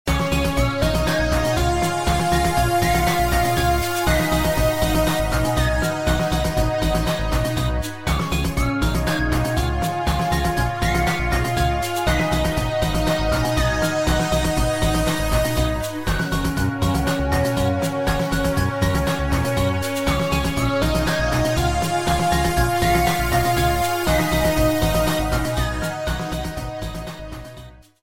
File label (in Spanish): tambores